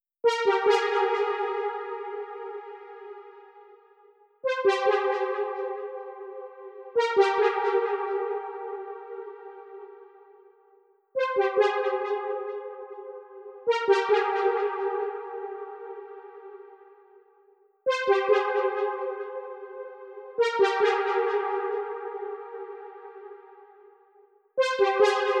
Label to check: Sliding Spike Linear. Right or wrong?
Wrong